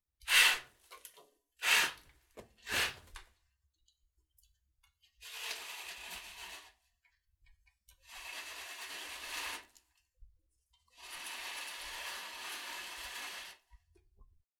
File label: Blinds Being Opened And Closed Quickly And Slowly